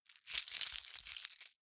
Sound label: key ring large rattle hard; objekte; schluessel